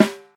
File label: clear snare g sharp key; snare drum samples